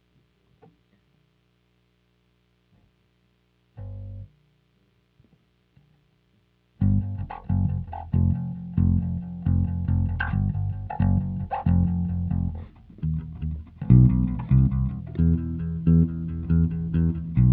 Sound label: Bass